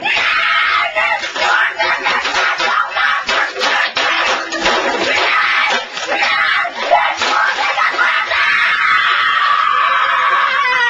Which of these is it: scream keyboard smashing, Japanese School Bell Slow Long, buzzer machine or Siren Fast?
scream keyboard smashing